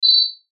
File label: Beep Tone Signal Multimedia; Web Interactive